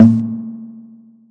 tom tom spring verb hi

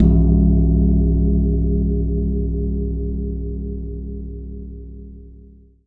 Long Gong Single Hit; ETHNIC and WORLD PERCUSSION; Longevity Gong by Amani

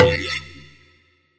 Processed Ethn Perc, Doru Malaia Percussion

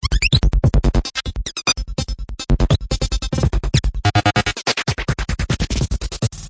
148 tweak beat; REMIXABLE COLLECTIONS; Kraddy Collection

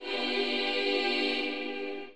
Slavic Choir G7 Ahee; Slavic Maybe Bulgarian Choir; VOCAL

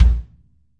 kick, Multisampled Real Kit; REAL LIVE KITS

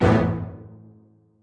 orchestra hit melody kit; PADS and STRINGS

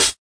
hat; Hats

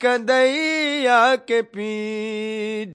male devotional, Indian Singing; VOCALS and SPOKEN WORD

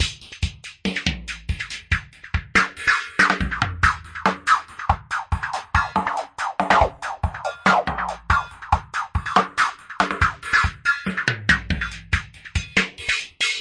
141 cruise filtered, drum loop; 141 to 160 bpm

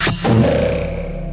crash arrow twang; Weird and Interesting Hits

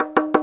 arabic perc, Arabic Percussion Kit; Arabic Percussion Kit 3

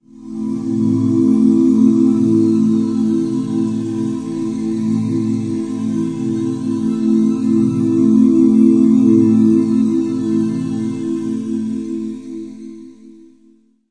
breathy heaven pad; Pads

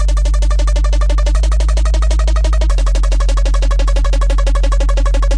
178 synth and bass; remixable collections

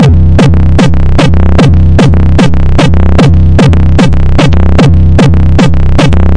150 overloaded banger; 141 to 160 bpm

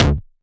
nasty stormfront; Electro FX; UNUSUAL KITS and FX

